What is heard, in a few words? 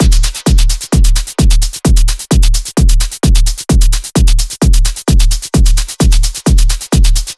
Drum Loop 130, Techno